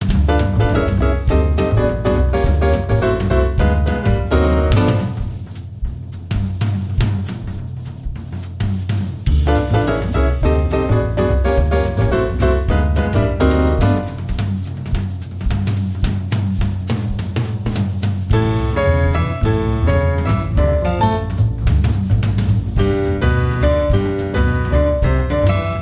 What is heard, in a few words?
Tribal Drums